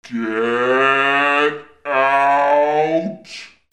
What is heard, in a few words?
CARTOON